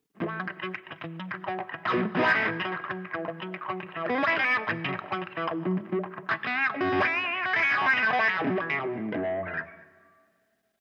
funk wah

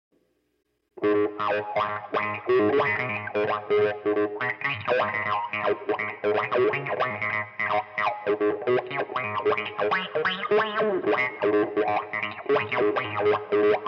techno wah

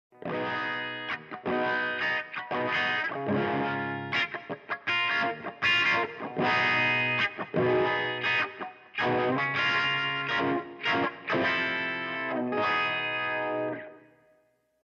subtle wah

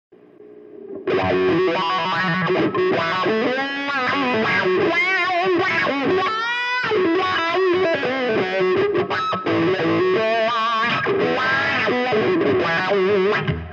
cool wah

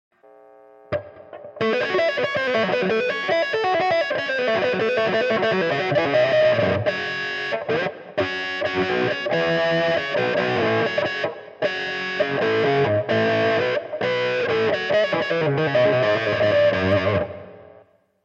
wah

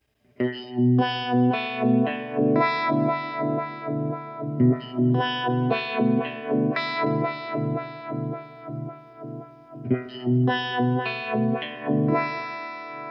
vibro wah

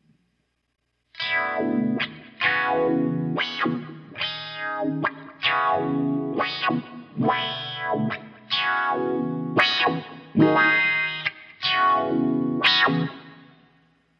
phase wah

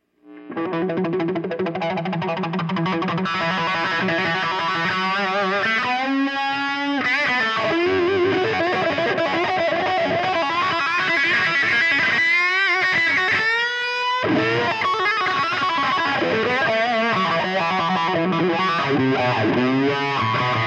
flying v wah

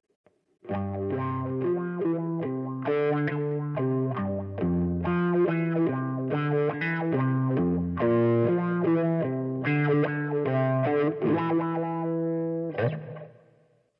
midnight wah

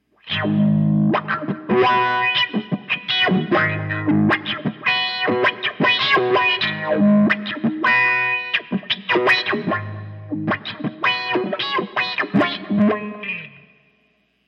moderate wah

